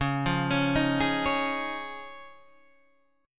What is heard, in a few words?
C sharp Major Add 9th, Guitar, Drop D